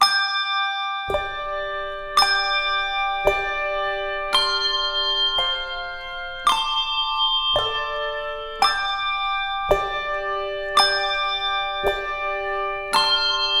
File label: Handbells 3-5 Octave; Lorenz Corporation